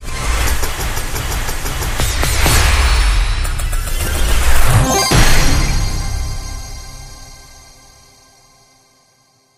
fs intro animation; netent; doublestacks

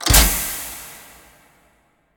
lock It Spin; netent; narcos

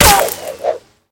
drive by impact spin; netent; narcos

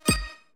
wild Land; netent; jewelfruits

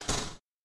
quick Stop; netent; piratefromtheeast

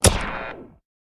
quick stop; netent

